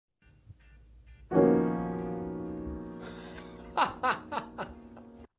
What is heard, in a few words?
Keys